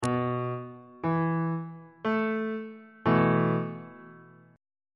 f flat suspended 4th 2nd inversion on bass clef

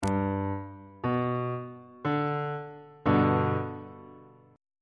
f double sharp major chord on bass clef

